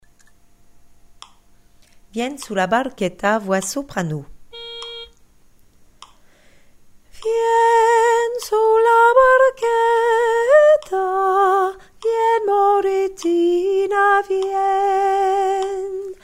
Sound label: vien sulla barchetta soprano